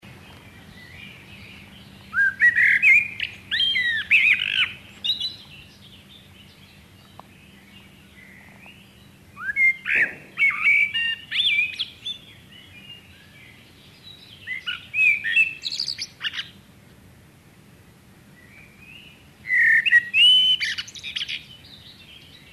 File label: Common Blackbird Turdus merula merula